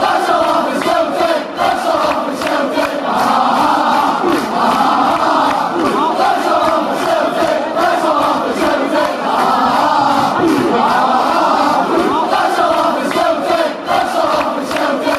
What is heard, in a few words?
lets all laugh at celtic, fan chants